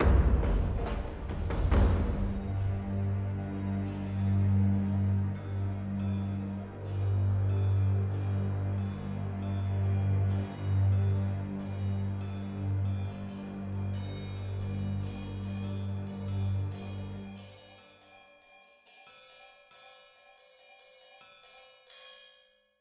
Boss Combat Intro Bed

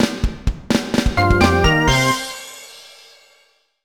Level Clear! Fanfare; mario vs. donkey kong 2 march of the minis; mario vs. donkey kong 2 minimini daikoushin; nintendo ds; 3rd Floor Fanfare

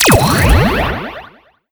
Activate Techy Shield

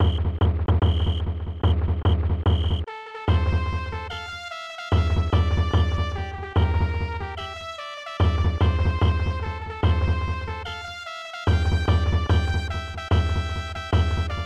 Hitting March; 1st Player

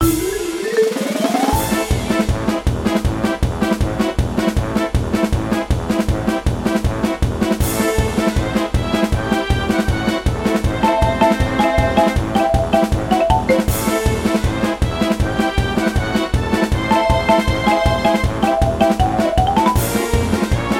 grass battle middle boss boss; world grass

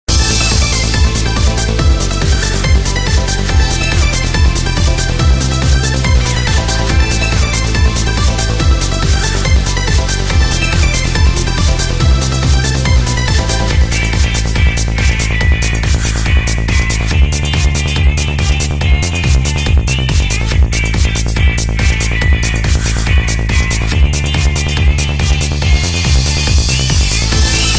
bgm trap; escape game himememe naranohito; android game music

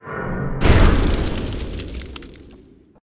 Flashfire; Idle Wizard

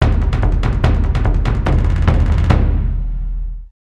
Fatality Drumroll; mortal kombat gold

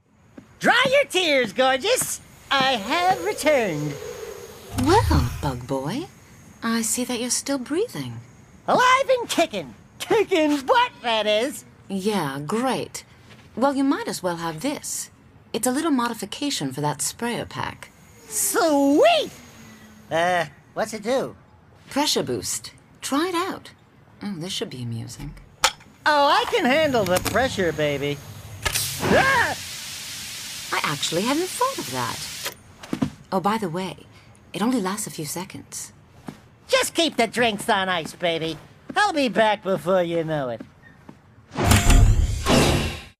Sprayer upgrade (cinematic)

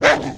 wolf attack

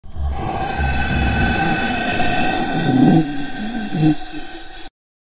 ZOMBIE SOUNDZ; 30 Days to Survive

